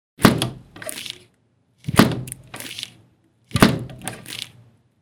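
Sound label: sharp sound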